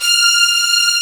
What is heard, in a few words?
STR2 SEC F5, Strings; STR SECT #2